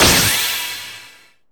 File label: SE GAME BREAK; maimai